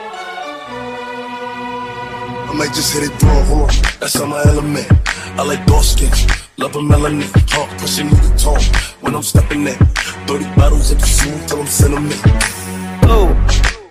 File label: Remix Ringtone